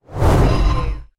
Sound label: Vanish Teleport